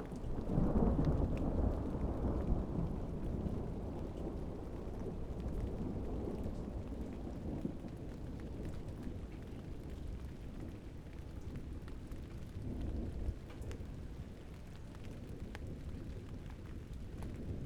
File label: Rain Thunderstorms Light Rain Thunder